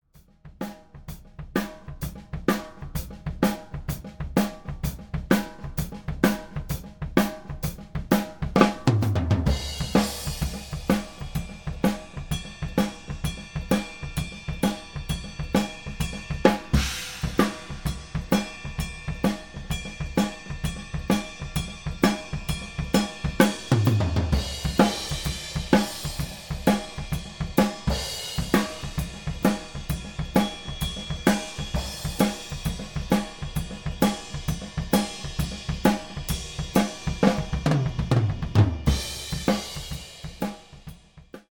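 Vgl Overheads MKH40 flat, Overheads solo - MKH40 in ORTF - flat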